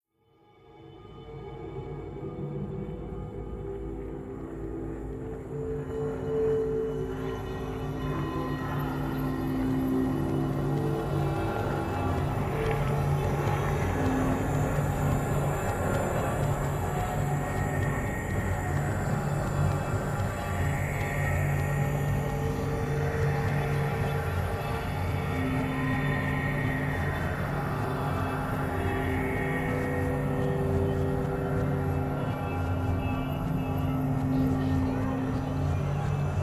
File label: Ambience Horizon